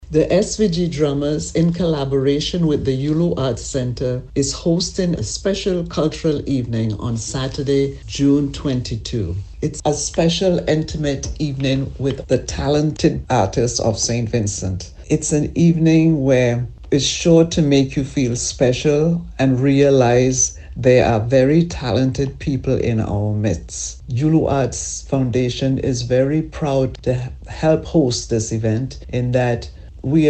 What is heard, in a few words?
MUSSER DRUM